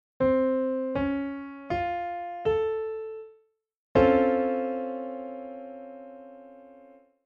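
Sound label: chord piano sus b9 dorian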